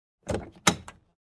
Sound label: Truck door open inside perspective